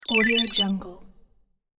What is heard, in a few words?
long thin arp interface